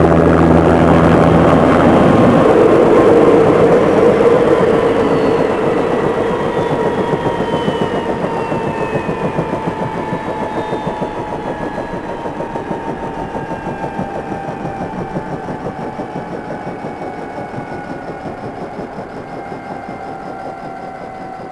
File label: Helicopter lands; Max Payne; manor